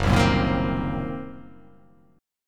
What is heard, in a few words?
Fsharp 6th Flat 5th down, chord